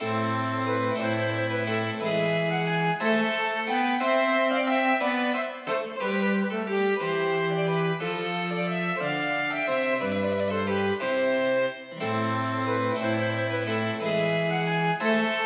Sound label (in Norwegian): Gig for fløyte, fiolin, cello og cembalo; Hertz